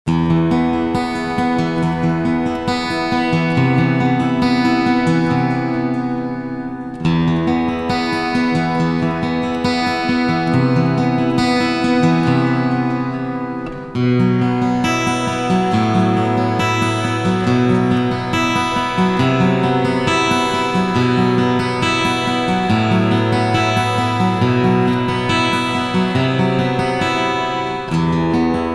FG TA left side no effect

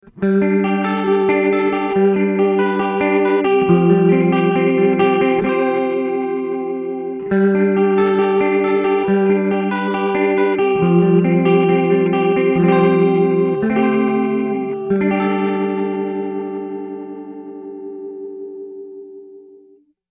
ROTARY

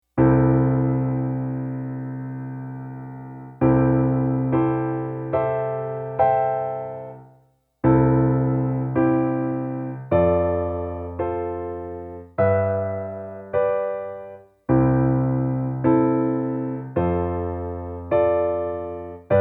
6th chord movement

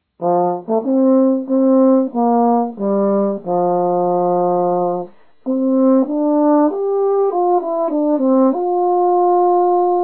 Euphonium

